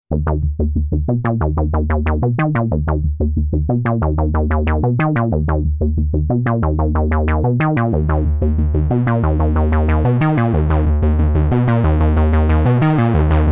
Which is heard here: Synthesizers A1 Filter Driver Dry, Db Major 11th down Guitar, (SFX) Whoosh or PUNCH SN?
Synthesizers A1 Filter Driver Dry